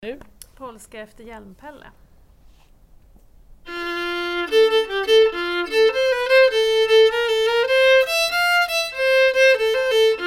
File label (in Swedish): Polska